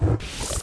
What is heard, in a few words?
spark die